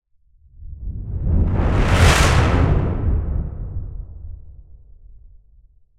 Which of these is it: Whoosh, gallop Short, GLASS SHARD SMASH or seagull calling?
Whoosh